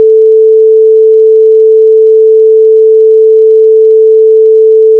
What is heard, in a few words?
sine; hl2